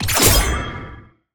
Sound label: minigame SFX assist; Irelia; bloom minigame